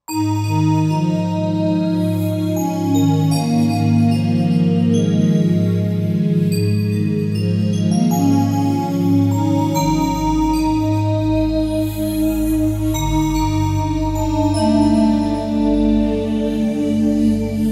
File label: New Sparkle, Korg Wavestation A-D